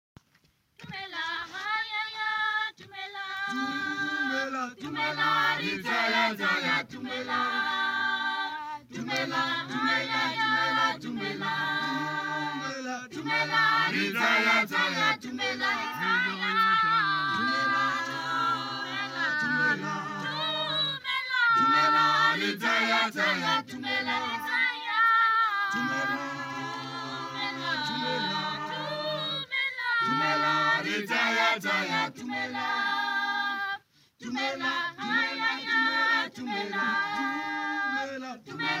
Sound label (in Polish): damara śpiew